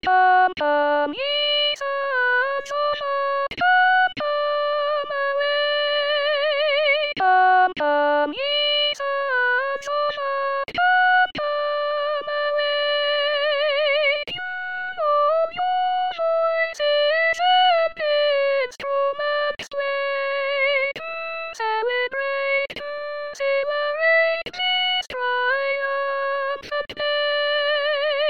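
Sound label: chorus soprano; purcell; ode 1694; come ye sons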